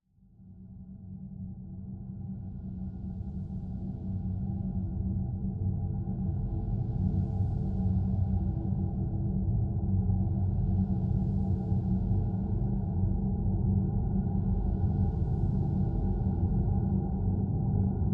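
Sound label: Drn Vertigo Mid, drone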